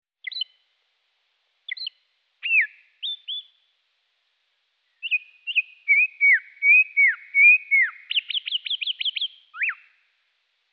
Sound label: PALLAS; UNE OCTAVE EN DESSOUS